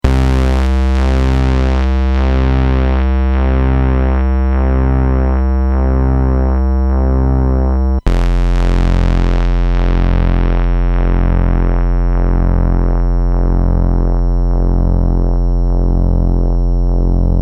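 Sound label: jx pwm trick, synth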